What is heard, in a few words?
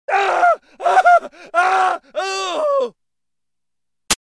uuh aah, dj tools